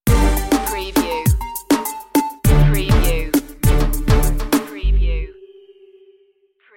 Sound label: tiempo de sol stinger